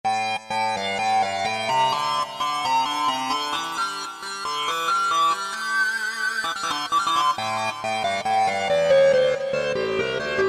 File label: Pro Sync, Korg T3